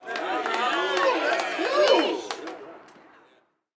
street ball crowd cheer low; SFX Kit; Crowds

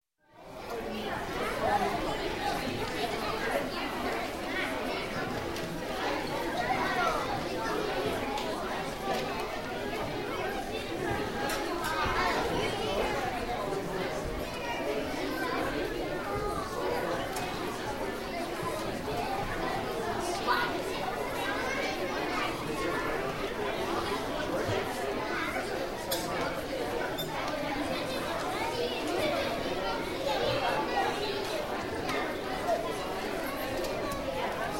Children School Auditorium - Entering With Teac; Entering With Teachers; Sound Ideas 2000 Series

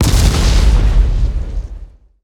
Explo Medium Powder Keg; Destruction

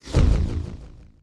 Menu Fail Error; Menu FX

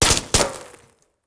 Bullet Ricochet; Sound Ideas; Guns

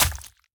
hit; Blind Gladiator

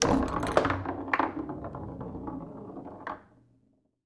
Foosball Ball Hit

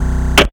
Drill Bits; Plastic Surgery

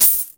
Pickup Coins; SFX Kit; Pickups

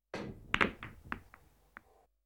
Billiards Various Different Hits; KMP Film Video Sound Effects

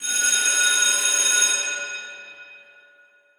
Bell Factory Ring; SFX Kit; Foley - Misc

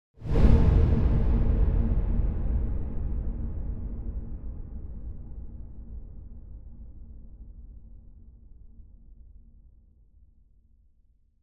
Impact Distant Dull Blunt Scary Short Impact; Hollywood Edge; sound designer's toolkit v2

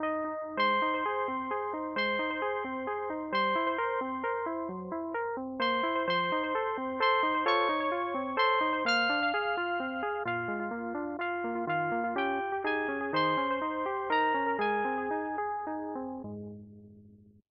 phone ringtone; devices accessories utilities; NS Studios - ultra sound collection

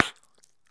Bubblegum Pop; SFX Kit; Foley - Misc

